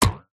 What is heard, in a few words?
cartoon impact twang; zapsplat